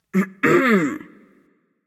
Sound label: clear throat; Smite; bellona